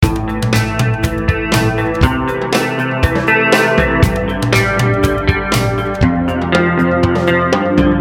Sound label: depressing rock music